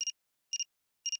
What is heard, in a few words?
Camera Timing; Alcatel Idol X